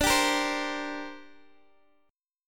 D 7th Suspended 4th Sharp 5th, down, Guitar, Open D